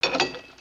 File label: Chest Idle